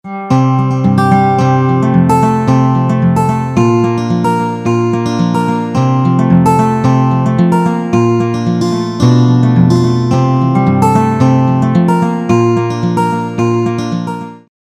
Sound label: Joyous